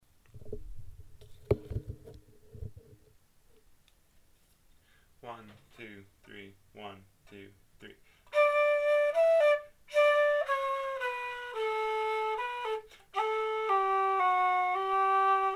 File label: waltz slow; The Belltable Waltz